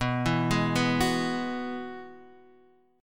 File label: G sharp Minor 7th Sharp 5th B